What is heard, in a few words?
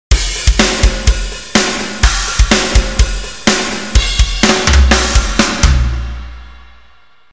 Drums ready